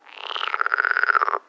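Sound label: ambience China 3D ambience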